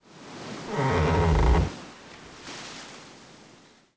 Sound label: squeak, SEA; AMBIENT; Pirates Of The Caribbean